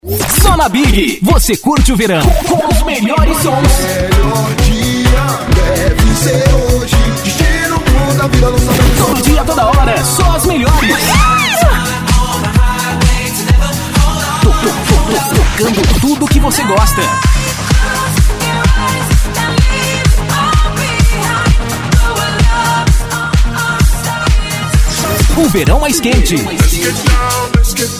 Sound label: beatmix; BIG FM